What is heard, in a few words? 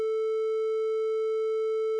trianglar